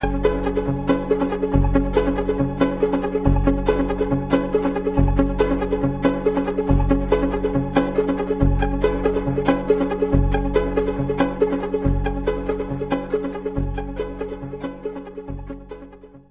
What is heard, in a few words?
cowbell